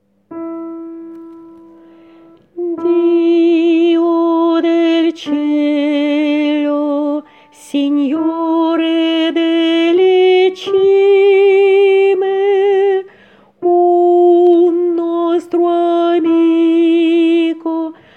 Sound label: Signore delle cime Alto